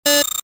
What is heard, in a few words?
button; Soldier Of Fortune II Double Helix; XBOX; movers buttons